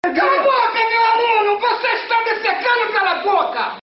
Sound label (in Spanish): defecando